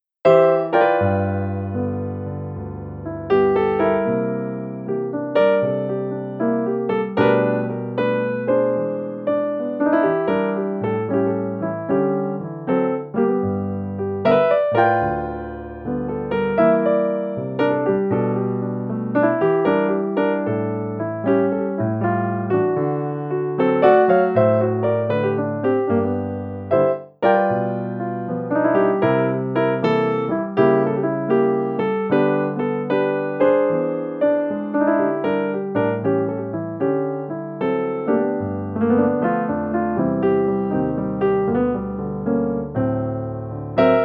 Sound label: Piano Bluesy Lounge Piano 77